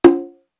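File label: dynamic A, drum kit; East West; Global Sounds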